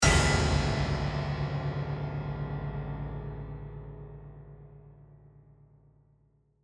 Horror piano chord crash piano lid haunted

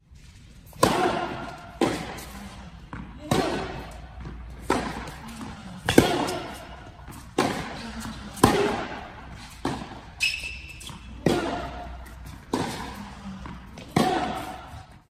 Tennis match indoor court

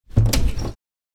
Wooden blind frame heavy open; blinds

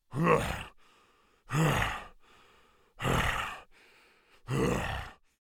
Orc creature grunting breaths

